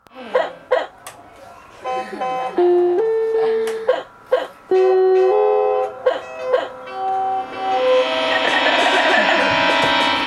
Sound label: improvisation performance